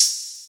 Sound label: tamed open hi hat sample f key, open hat sound tuned to the F note